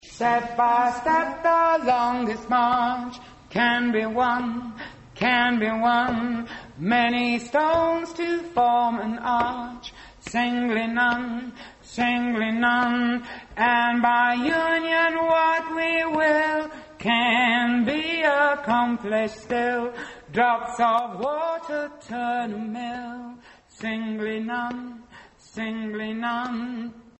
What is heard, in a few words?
alternative bass